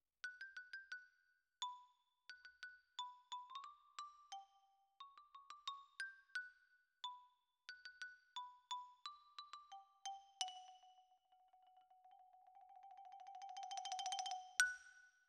Xylo Close

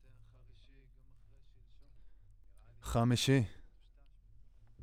Voc OD

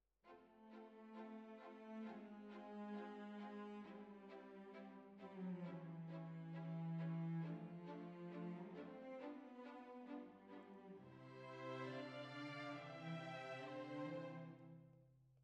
Flute

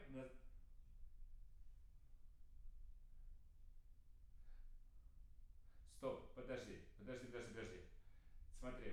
PIANO

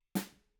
Ride

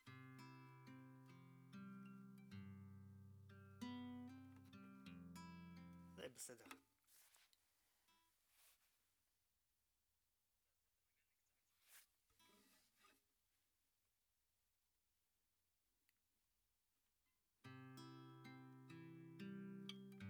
Tape GTR

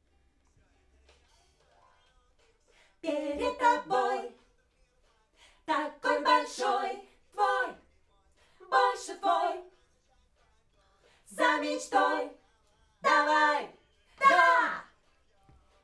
Backs AKG

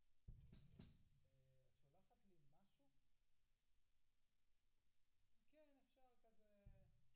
Kick In

